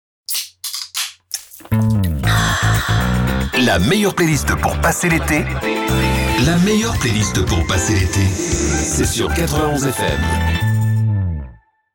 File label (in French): Jingle Eté